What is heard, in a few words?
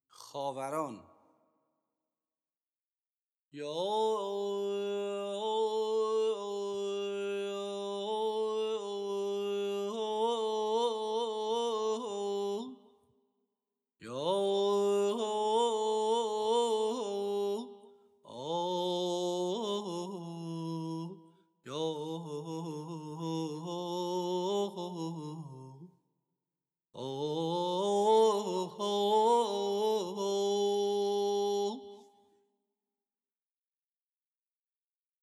Khavaran Mahur Radif-e Karimi; Dastgâh Mahur